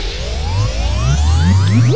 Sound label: POWERUP; THEXDER